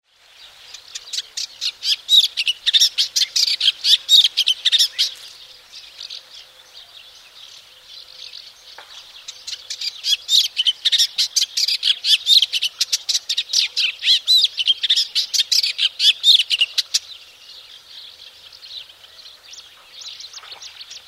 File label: eastern olivaceous warbler